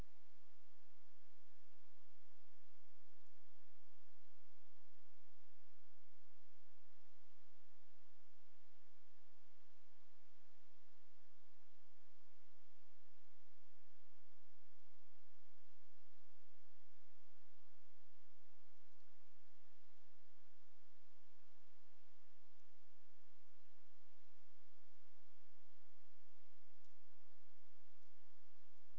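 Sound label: hydrophone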